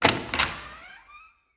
door generic open

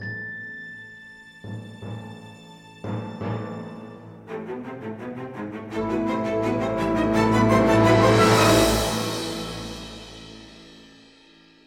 Battle (Music Cymbal); Kingdom Hearts III Re Mind; Luxord

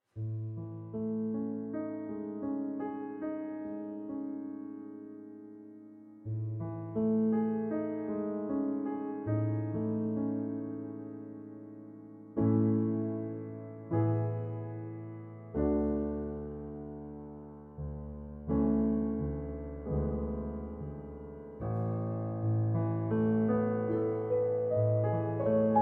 World Music

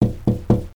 Knock Knock Knock; Learn to Fly 3

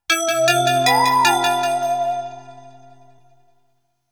Pattern Clear BGM; IZANAI

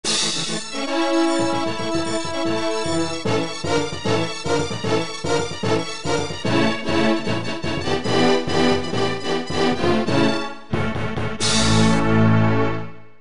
Stage Clear BGM; bakuretsu muteki bangai-o

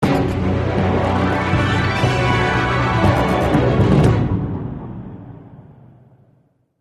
Suspense

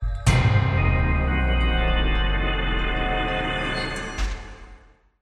Horror

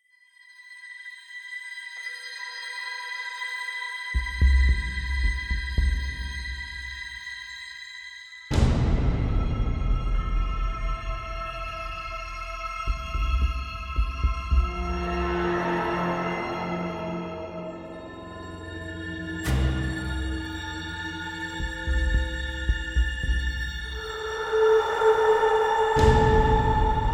Mounting Tension; Xenoblade Chronicles 3

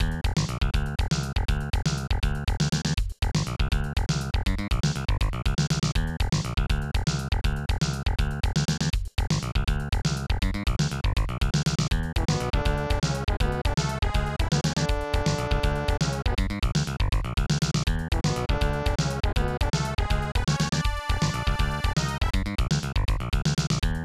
Level Music; Harley's Humongous Adventure; snes

